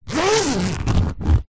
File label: foley suitcase zipper